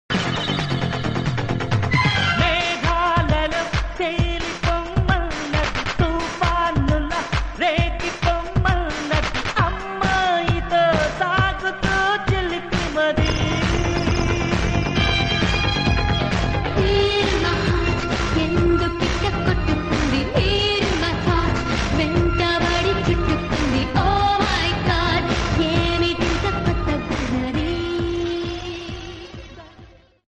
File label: telugu song